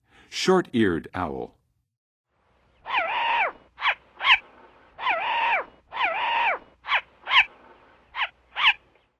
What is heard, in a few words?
Short-Eared Owl, Birds of North America; Western USA; Western USA Songbirds